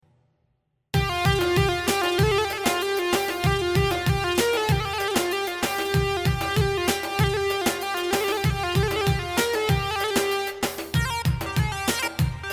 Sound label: IRAQI LOOP